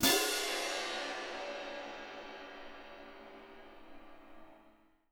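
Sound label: CYM P left, cymbal, percussion; Miroslav Vitous - Percussion & Harp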